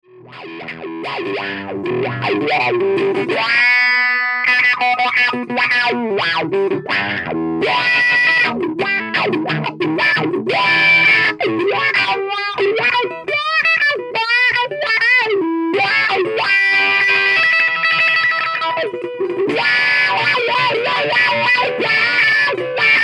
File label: wah